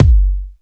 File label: reverb bass drum single shot g key